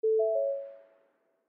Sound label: Ford Chime